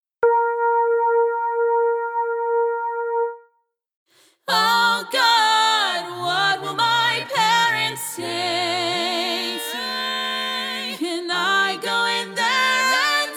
Lead, barbershop tags

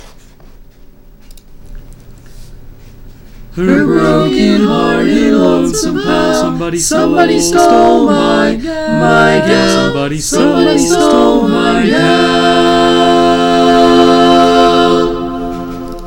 barbershop tags, all parts

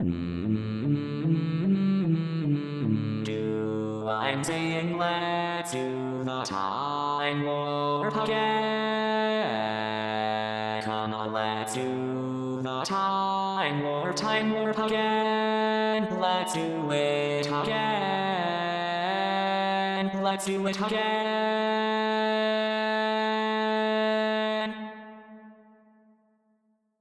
Bass, Barbershop Tags

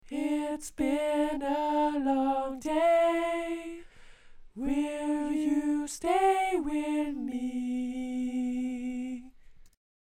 Lead, barbershop tags

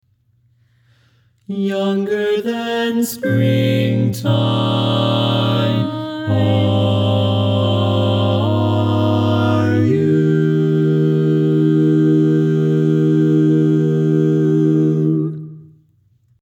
All Parts, barbershop

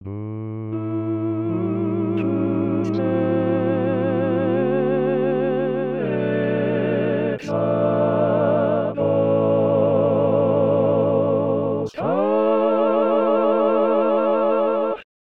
All Parts, barbershop tags

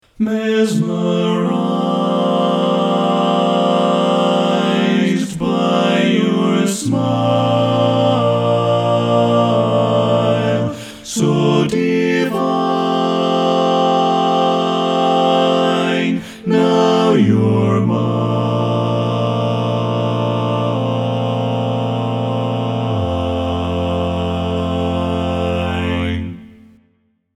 Mesmerized, All Parts, barbershop tags

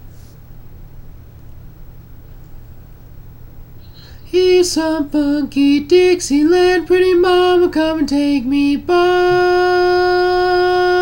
tenor

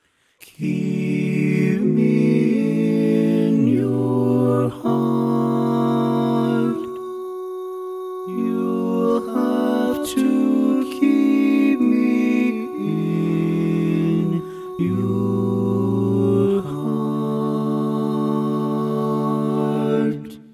Bari, Barbershop Tags

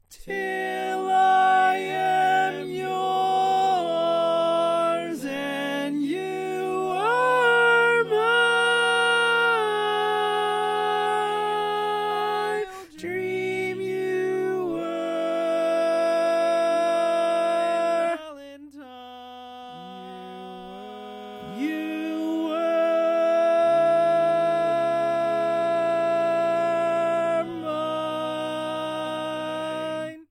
Tenor

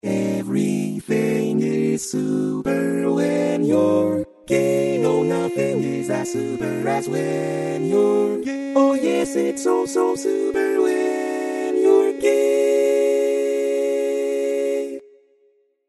Lead, barbershop tags

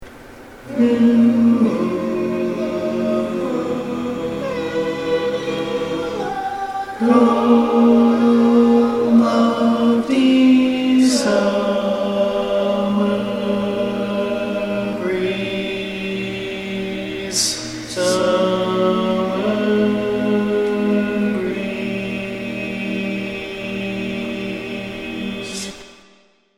Lead, barbershop

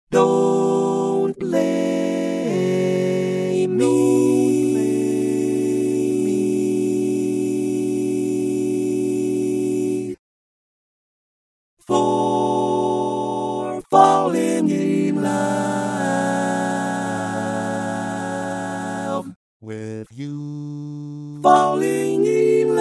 Tenor, barbershop tags

